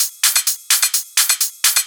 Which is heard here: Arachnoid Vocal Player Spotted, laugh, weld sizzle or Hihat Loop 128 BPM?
Hihat Loop 128 BPM